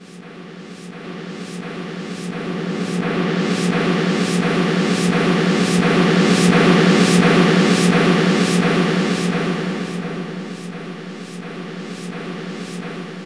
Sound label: Machines; Dream Zone